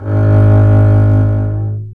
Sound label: STR ARC BS, Cb Bowed, Cb1 mf vb; String Master Series